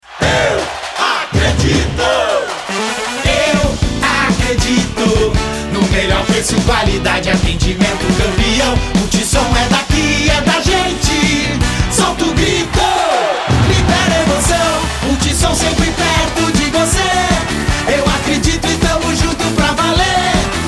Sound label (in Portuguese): Jingle Eu Acredito; Multisom; campanha; Copa do Mundo